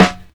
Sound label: clear acoustic snare f sharp key